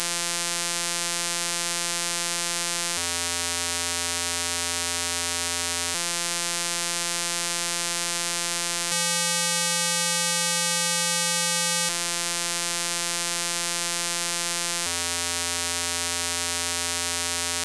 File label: buzz